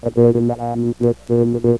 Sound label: Bird (F1)